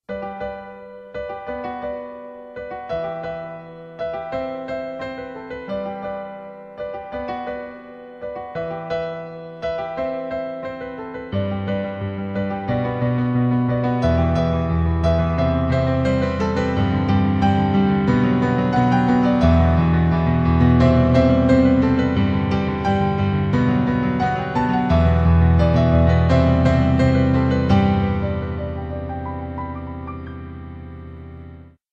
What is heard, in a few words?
Piano